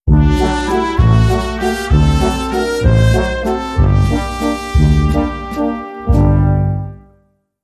Valzer, Jingle

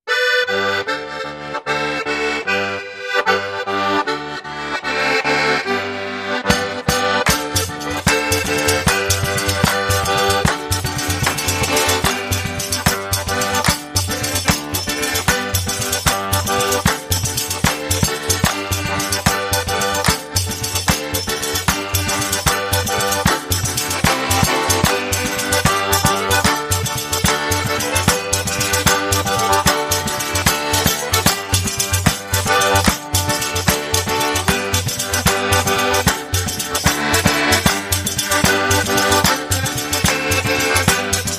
Tarantella Inst

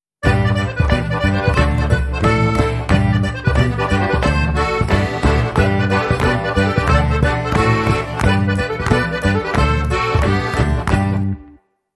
Pizzica, Jingle

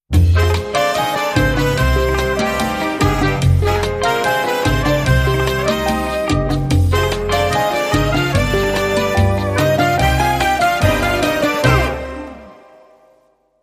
Mambo, Jingle

